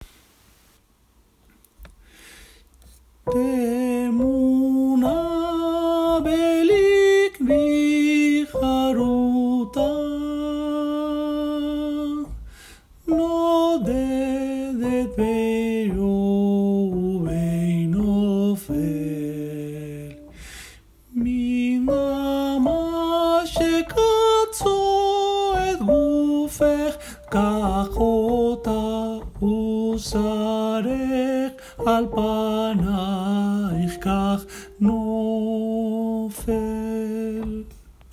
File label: Five Hebrew Love Songs I Soprano